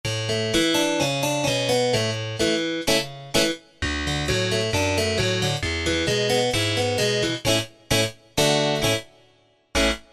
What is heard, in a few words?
Harpsicord, Korg M1; Factory preset examples